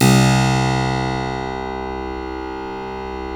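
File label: C1 clavinet right, Keyboards Acoustic; USB Soundscan vol.17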